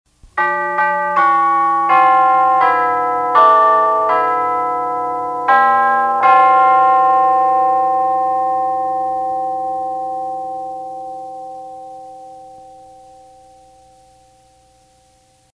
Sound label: Grand Chimes; PAMS Numbered Series; KFJZ